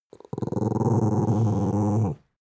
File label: dog small growl wavy